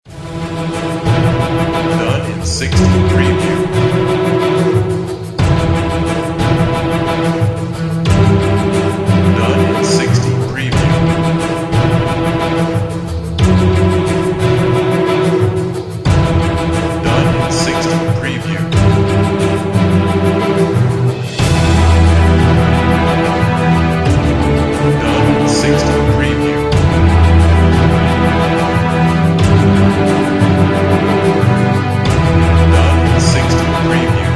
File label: Epic Cinematic Action Suspense Film Music